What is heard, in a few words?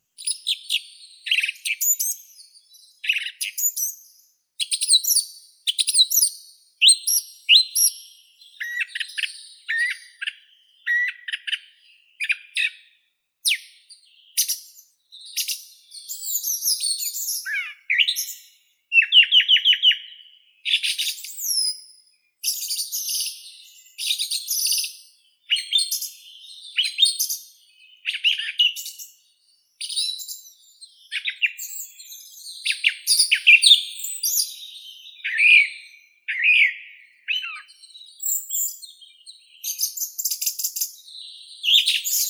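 song thrush, turdus philomelos; drozd pevchii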